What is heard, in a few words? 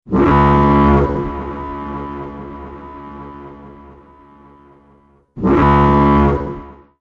Extreme Alarm